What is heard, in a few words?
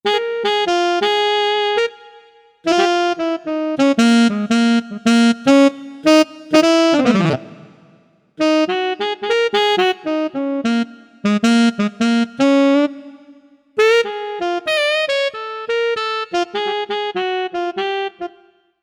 Tenor Sax